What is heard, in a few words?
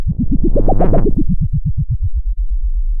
Sound of waves crashing on a shore